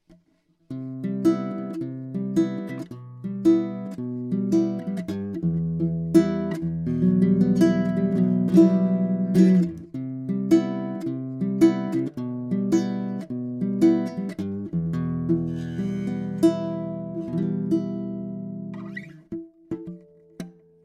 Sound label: Fingerpick mikro